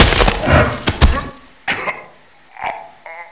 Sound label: grunt thrown through wall